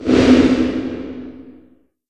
LH S909 -R, REVRB TR 9+8 LARGEHALL909

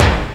hit, PERC; Techno Trance; DRUMS; Techno Trance Essentials

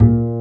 WOODB. A#2; Analogon

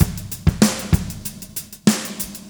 Fast 16 096, Beat Loops; Zero-G; Monster Beats

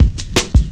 Drum Loops, Jungle

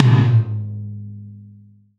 Tom, Gated Reverb; musicradar

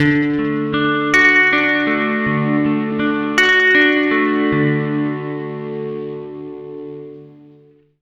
80 MAJ ARP D, Acoustic Guitar Loops; USB Soundscan vol.04 - Electric & Acoustic Guitar Loops; AKAI

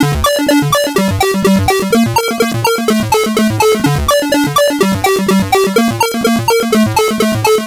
lo bit shuffle Dm 125, synth loop; Zero-G; Soundclash; Nu Dance Electro

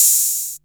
HH OP, HH OPEN; USB Soundscan vol.20 - Fresh Disco House I

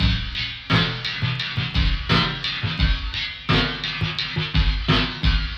Flange FX, Loops, breaks; M8

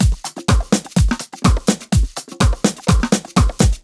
pushed, 125 Beats; PowerFX

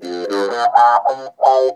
NOTE BOX, Funk Guitar; 90 s Sample CDs; Zero G

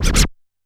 G3 Scratch, Yamaha PSR-E323 Sound Effect Kit

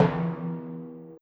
Timpani, Orchestral Percussion One Shots; Sonic Foundry (Sony Creative Software); Orchestral VOL-2

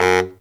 baritone fx; Zero-G; Phantom Horns

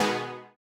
Housey Stab B2; KG MegaSaur

